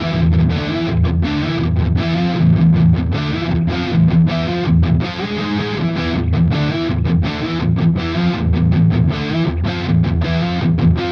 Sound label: Epiphone Prophecy Les Paul Zerre Both Modern